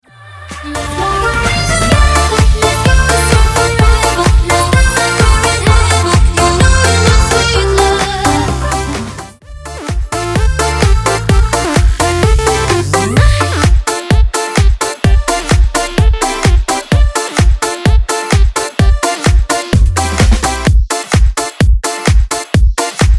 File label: 128 bpm